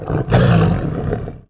troll; grond

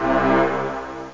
orch brass; OctaMED SoundStudio V1; mirage

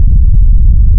Rumble; Forsaken

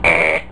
EEH!; Alert Beeps

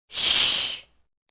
shh

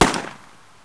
rifle

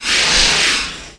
plasma dart

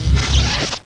gate open

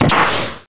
MISSILE

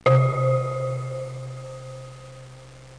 mouse-down; xRes 2.0 SE

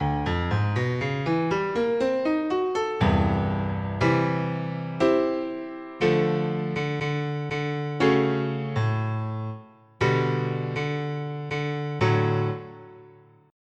Steinway Bright Rev, Grand Piano; Steinway Grand Piano 1.2

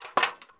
ft step

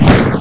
stomp; beavis; ant

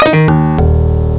PIANO; b&p demo; instruments

